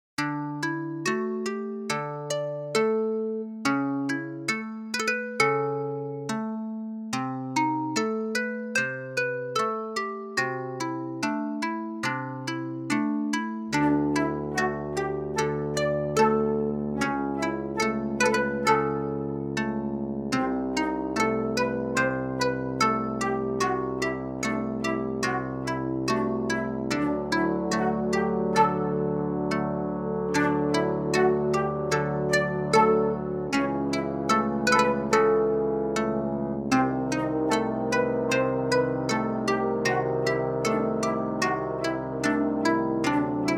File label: disco